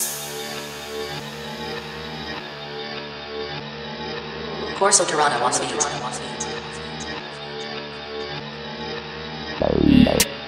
Glock

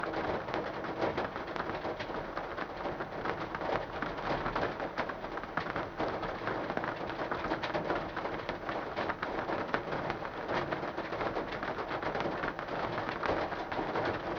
LoFi Rain on Window

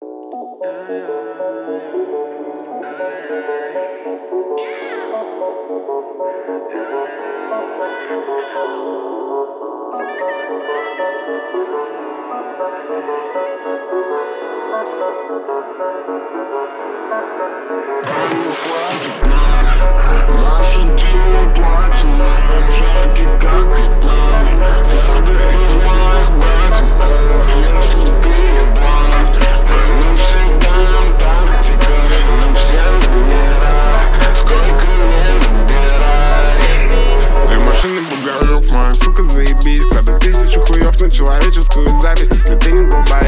33.35.37hz Low bass

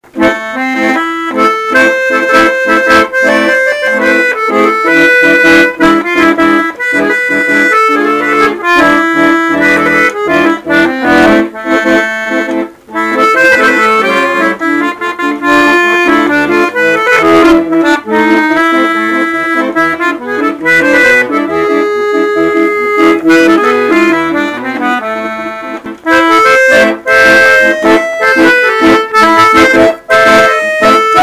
akkordeon, Evr. naigrysh

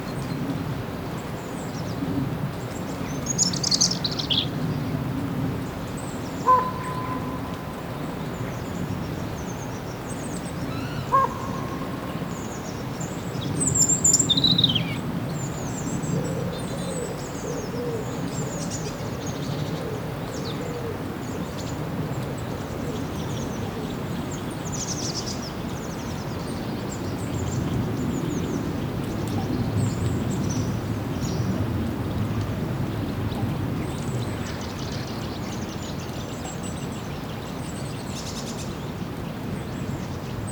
Pink Noise - Grassland Raven Animal Calls Wind; Relax Meditation Sleep; reiki

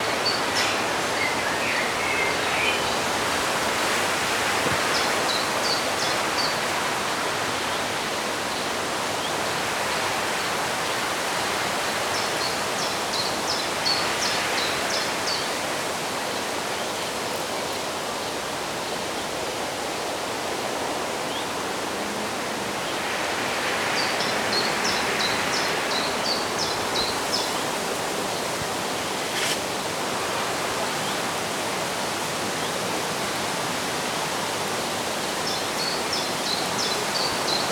ASMR Nature Noise Wind, Leaves Falling

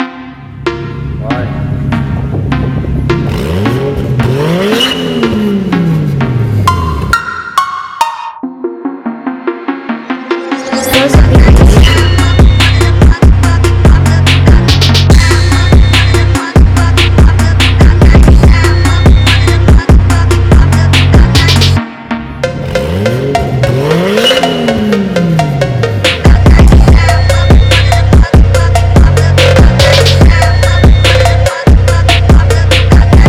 PHONK

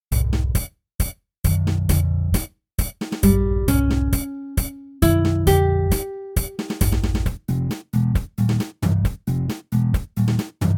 Acoustic Guitar

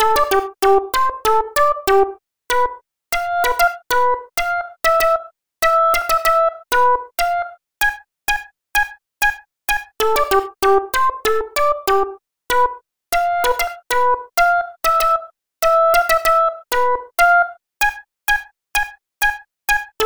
Pad Pad Pad

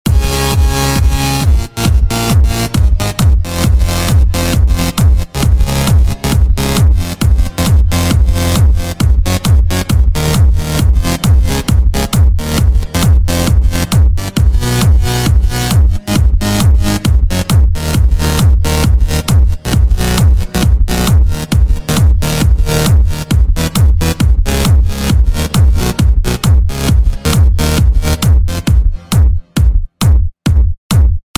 Klub Mix Super Bas Drum

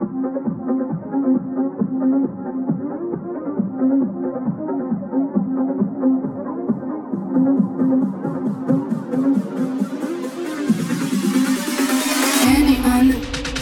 Drift Phonk

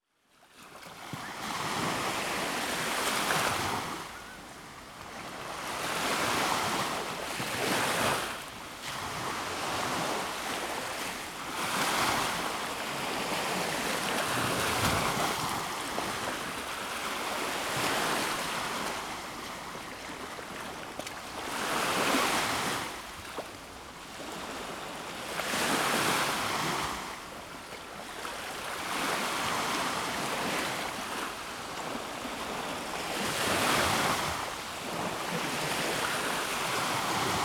Ambient

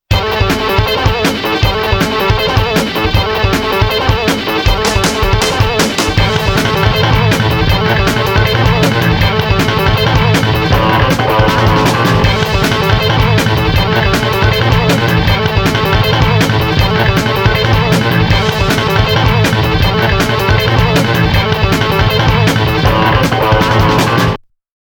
Extreme, Metal

